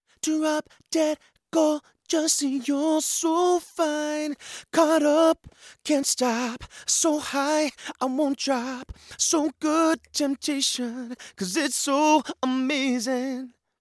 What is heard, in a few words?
male vocals; lewitt; mtp350cm